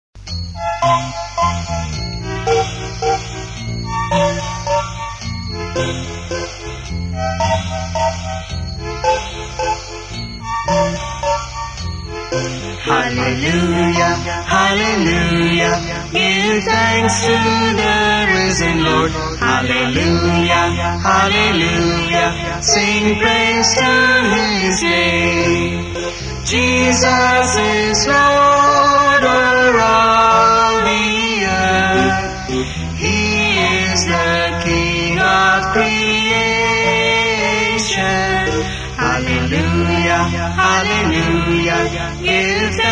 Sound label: Hallelujah Hallelujah, SONGS; UESI AP SONGS; English